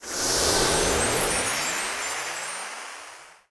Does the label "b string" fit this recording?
No